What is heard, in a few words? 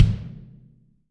BD, Real Mega Drums